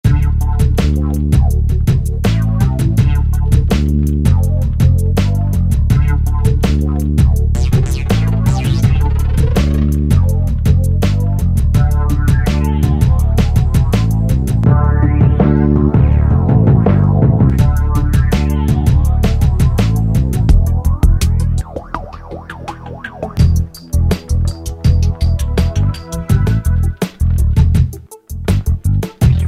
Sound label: Space Hop